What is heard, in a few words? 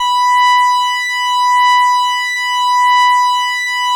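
ARP lead B4; Sonic Reality; Vintage Timetraveler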